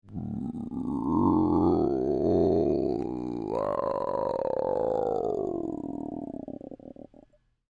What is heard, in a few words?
Creature Groan Throat Rumble, Human; Groaning; Voice